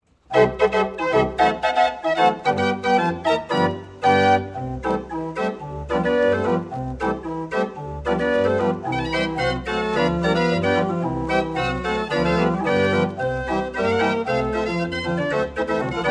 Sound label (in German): strassenorgel; fufulu